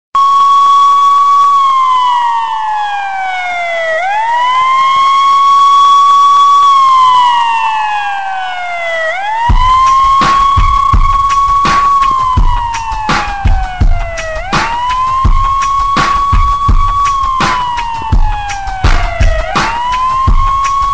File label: Police Signal